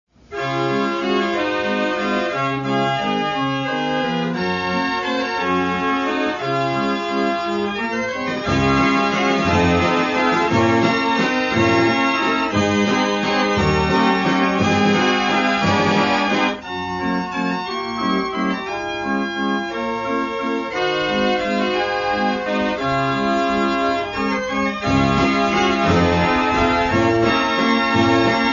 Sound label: Waltz